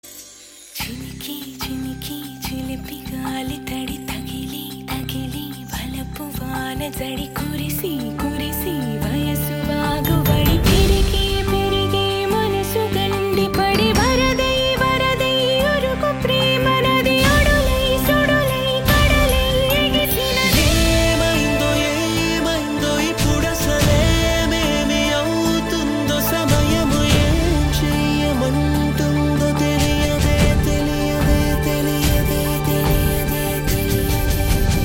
Female Ringtone

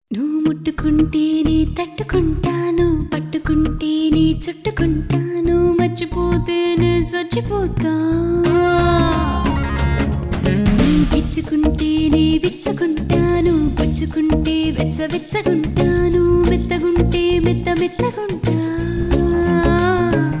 Ringtone

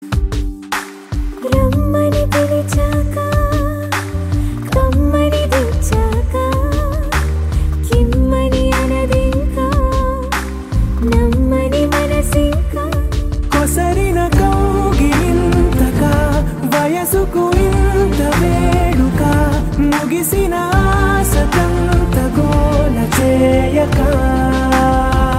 Female Ringtone

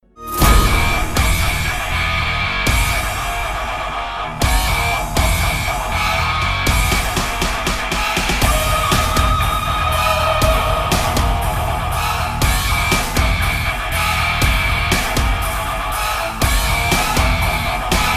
Fight BGM

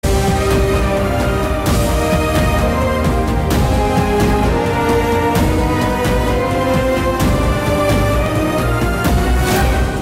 Trailer Bgm; NTR Mahanayakudu

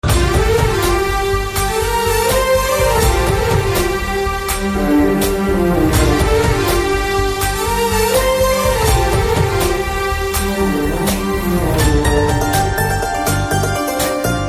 Instrumental Tone

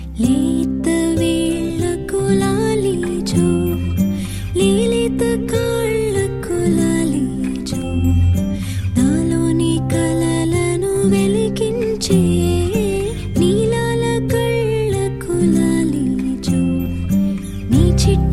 Ringtone

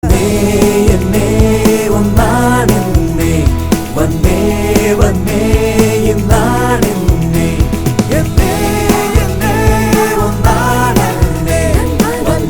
Love Bgm Ringtone

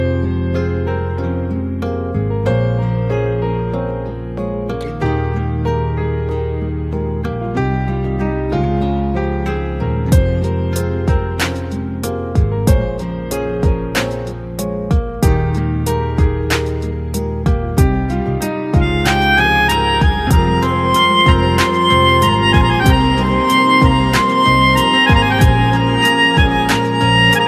Instrumental Ringtone

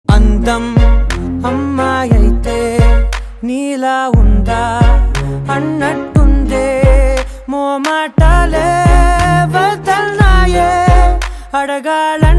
Love Ringtone

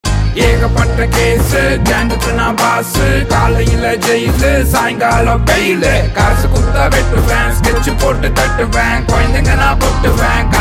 Tamil Song Mass Ringtone

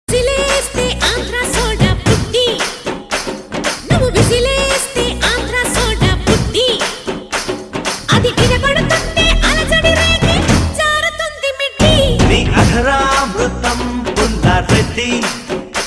Whistle

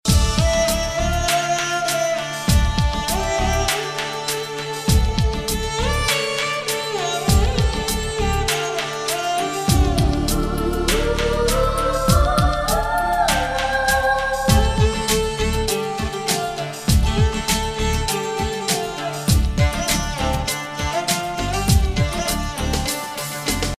Music Bit

